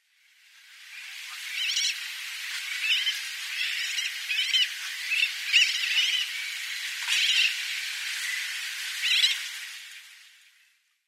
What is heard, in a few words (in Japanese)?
seguroajisashi; okinawa